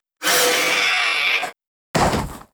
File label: CROOK WAR DIE